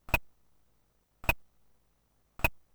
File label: Clunk, clunk, clunk; lemmings; x68000